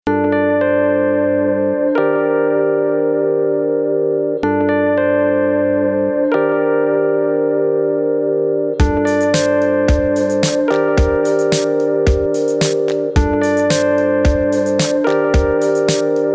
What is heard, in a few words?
Geinou Dimbula 110